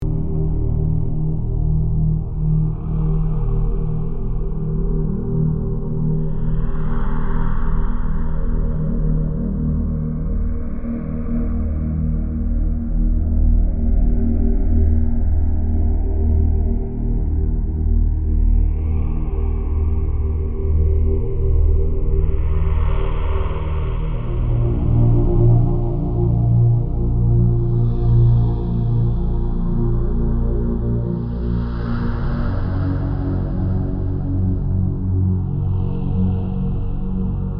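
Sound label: Music Lobby Drone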